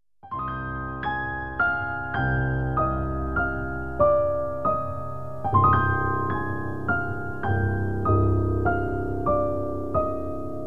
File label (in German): Glocken Fantasie